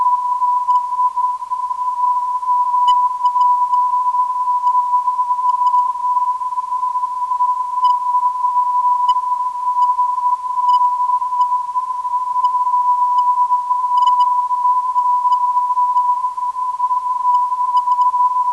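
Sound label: non-directional beacon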